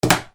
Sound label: CLICKS